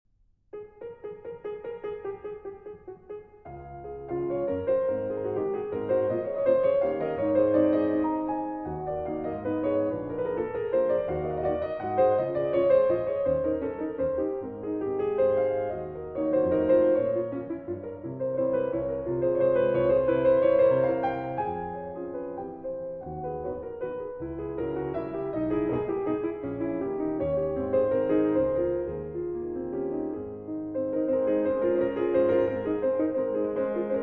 No. 2 in A-Flat Major (Allegretto); 3 Nouvelles études, B.130

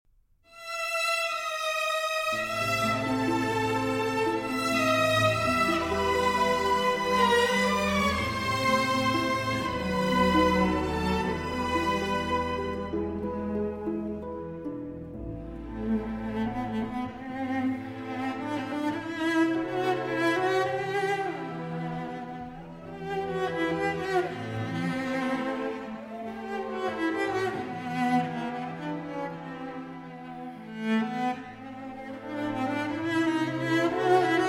Cello and Orchestra

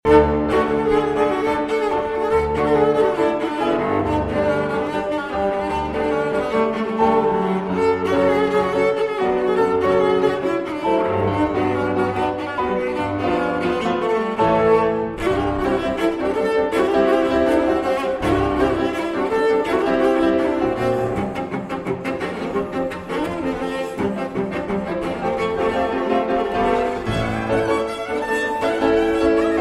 Romanian Folk Dances, Fast Dance; Six Romanian Folk Dances, BB 68, Sz. 56; Arr. Székely Transcr. Maisky

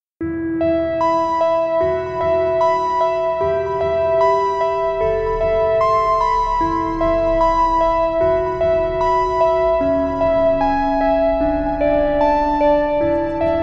Sci Fi Music

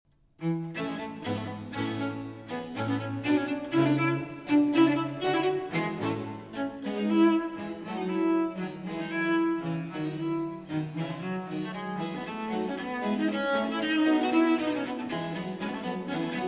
Sonata For Cello and Continuo in B Flat; Vivaldi; R.45

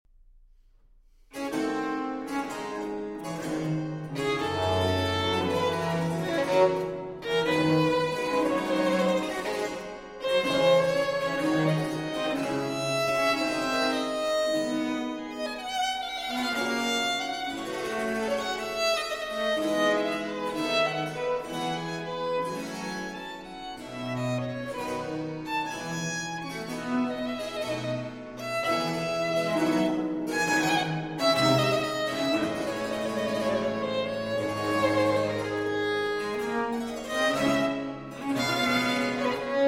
Violin Sonata in D Minor, Largo

